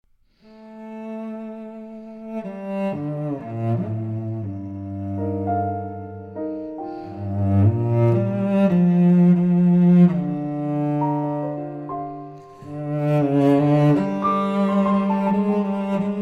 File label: Cello and Piano